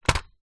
leisure toy truck plastic rubber wheels down on ground